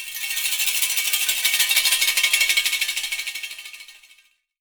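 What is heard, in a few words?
AFRICAN TIN, TIN RATTLES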